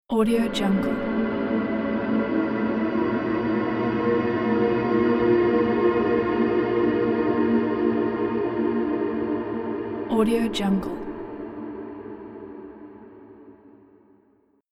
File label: dark ethereal transition